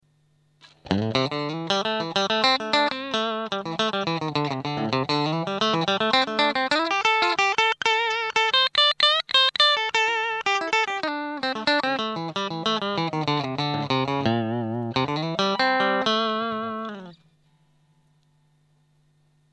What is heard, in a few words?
Bridge Squier, guitar pickups